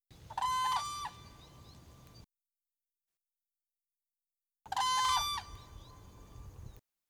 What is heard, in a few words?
whooping crane guard call